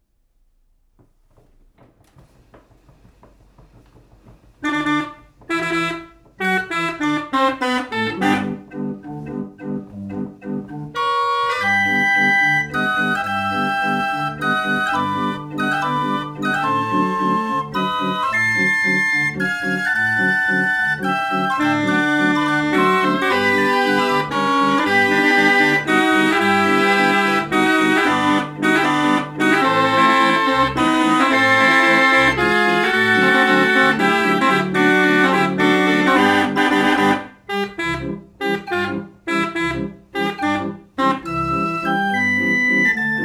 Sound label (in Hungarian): kintorna perforált papírcsíkok 45 hang németország; verkli hang muzeum; rudolf brunst